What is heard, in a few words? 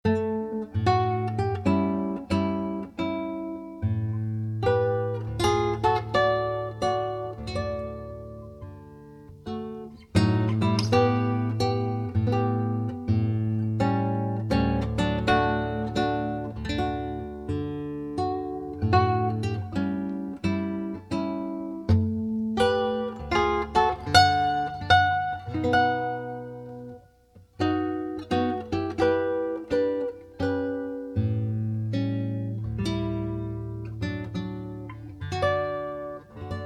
Classical Guitar, Prelude; Chopin